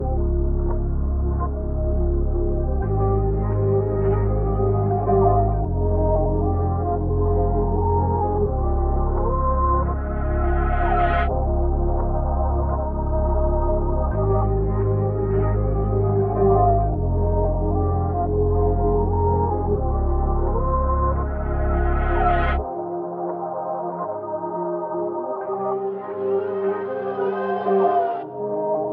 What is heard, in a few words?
85 BPM A# Min, Melodies, Reverse; Sonata; Cymatics; Destiny Premium Melodies (BETA)